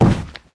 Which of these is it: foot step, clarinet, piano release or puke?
foot step